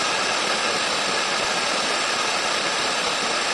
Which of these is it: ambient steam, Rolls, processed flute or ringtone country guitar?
ambient steam